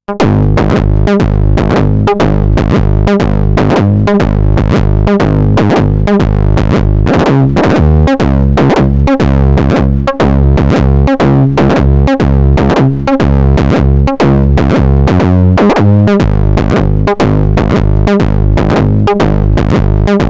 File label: Mutant Cure VCF wet Tube VCA